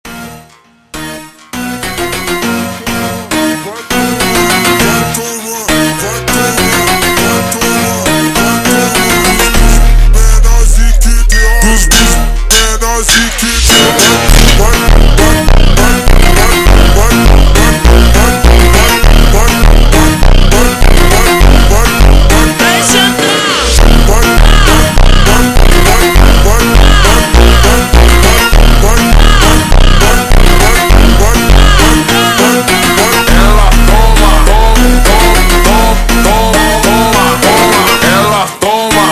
Montagem extreme Slowed

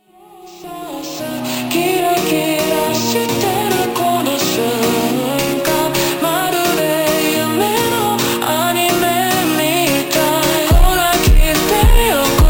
Montagem ultra Slowed

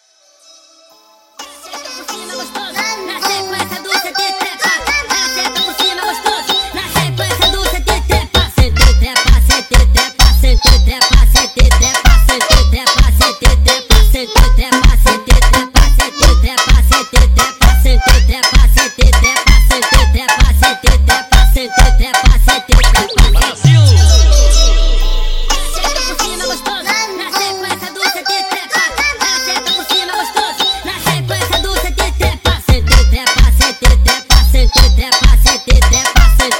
sped Up, funk

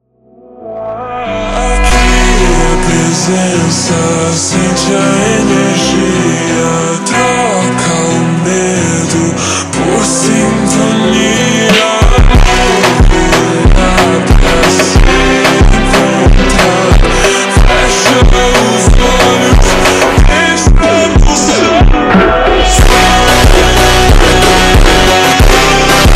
Montagem ultra Slowed

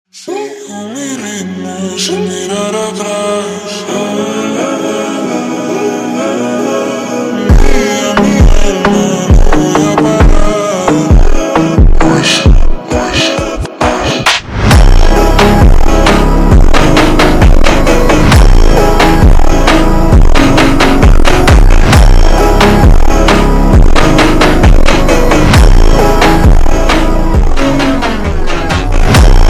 Funk ultra Slowed

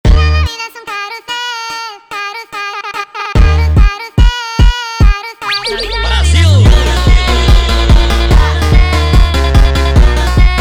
Montagem sped Up

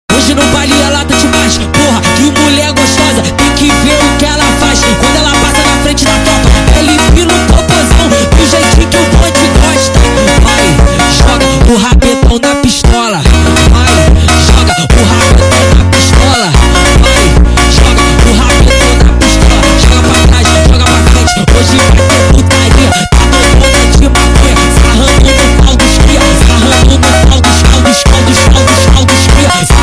Montagem Sped Up

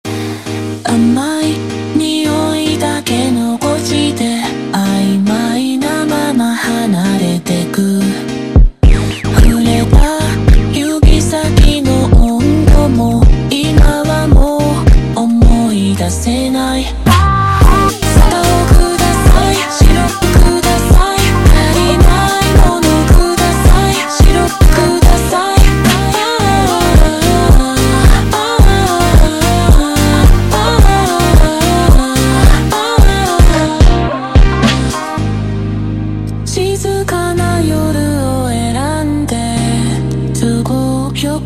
Montagem super Slowed

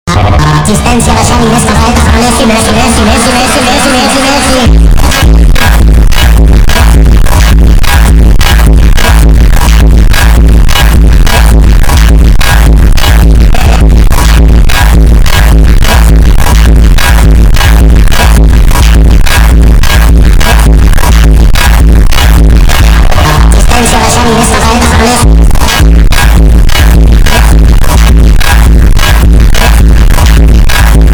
Funk superbass reverb slow

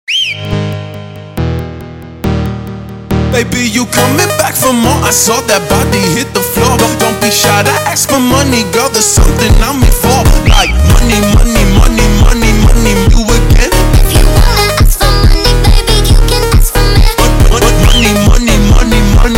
Montagem sped Up

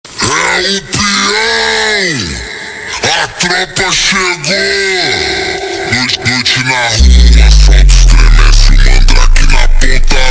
Montagem ultra Slowed

